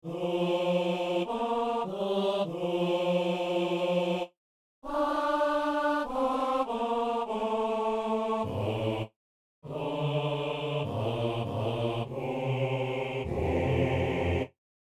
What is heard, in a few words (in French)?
dieu est amour, quatre voix, basse